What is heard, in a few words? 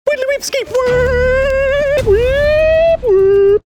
mouth siren